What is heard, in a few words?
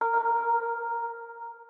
organ